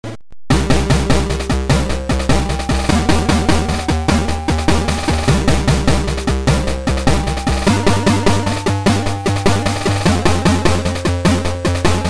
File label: gameover; schluempfe